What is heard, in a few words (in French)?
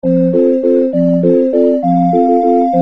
basse noir noir basse 2 croches noire; Accompagnement